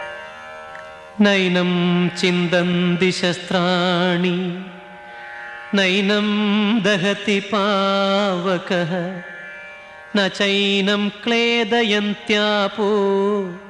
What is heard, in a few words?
Saibaba Mantram